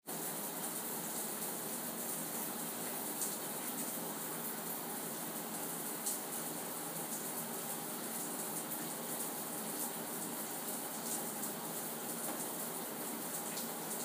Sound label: Shower